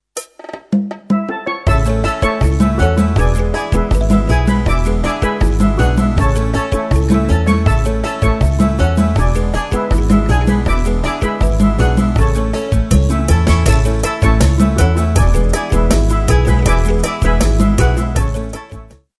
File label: Salsa; Sferia; MAYA